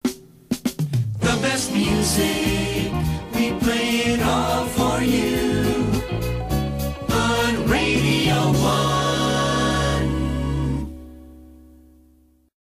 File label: music jingle